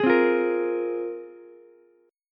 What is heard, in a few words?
A sharp 7th Suspended 2nd, Ukulele chord; strummed; JGuitar